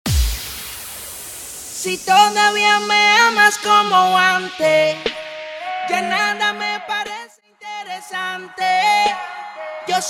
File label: Acapella Starter 120BPM